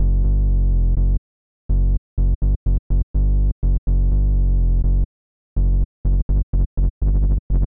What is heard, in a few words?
Mod wheel